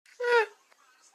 "heh"

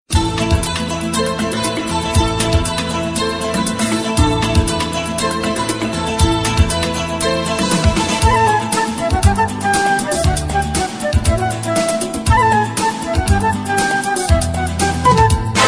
Musical Tone

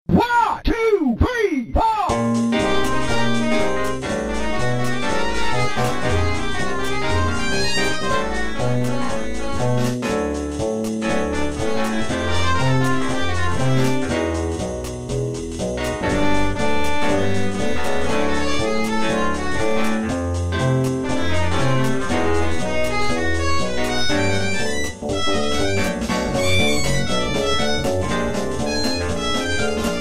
Blue Jazz

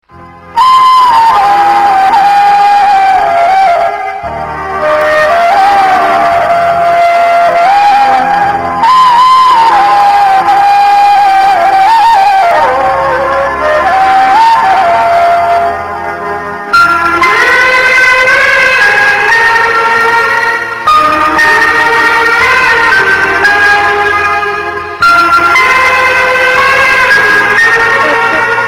Bgm Flute

